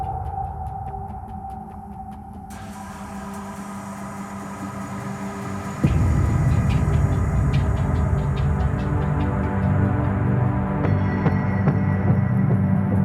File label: Hub Vendor Loop; Zariman